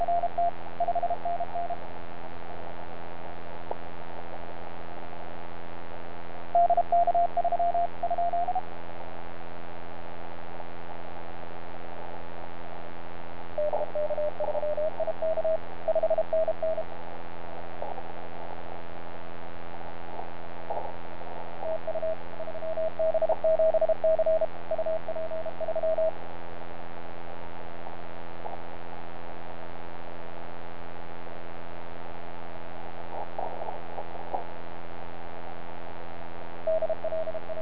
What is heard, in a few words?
30m cw